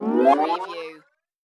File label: Ascend Musical Delay; sound effects bible